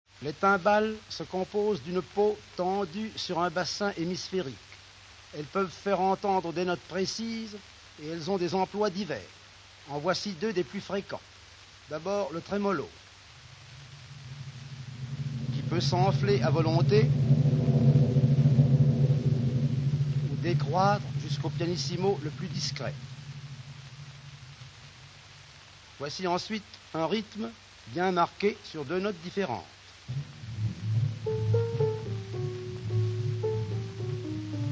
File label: orch timbales